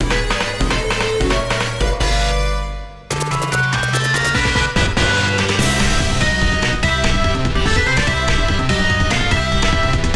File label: Underwater (Hurry!); paper mario the thousand year door complete; Super Bowser Bros.; GameCube version